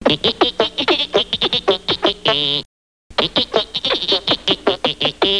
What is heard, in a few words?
bugs